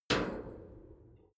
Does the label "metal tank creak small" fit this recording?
Yes